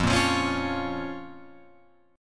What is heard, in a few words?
F Suspended 4th Sharp 5th F down Guitar Open F; Fsus4#5 strummed; JGuitar